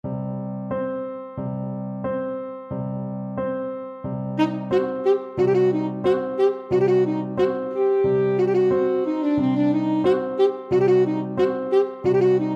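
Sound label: romanian folkdance, alto saxophone; bartok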